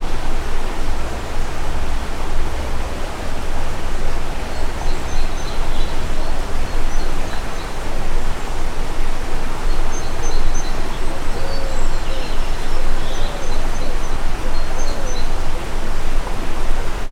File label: ambience nature birds river; sound effects one